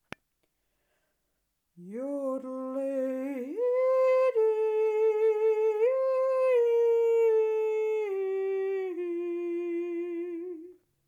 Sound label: Yodel